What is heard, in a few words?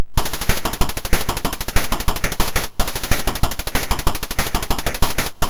Drums, sid MOS6581R2